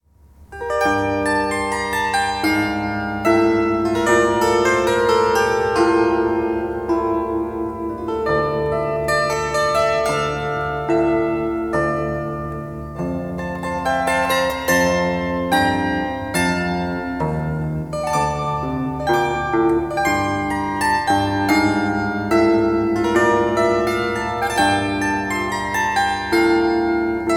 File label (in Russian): Ukrainskaya melodiya TSimbali; vesillya